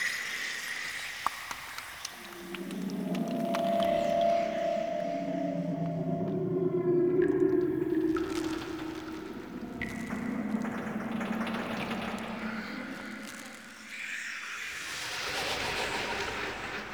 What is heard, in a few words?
Exterior Hub Loop, Ambience Infested; Warframe; Infested Eris; Amb Loops Exterior